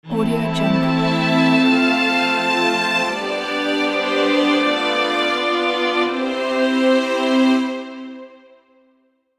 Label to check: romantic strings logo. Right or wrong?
Right